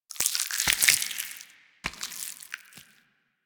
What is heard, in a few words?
Taunt Flesh, Proto Infested Volatile Runner; Warframe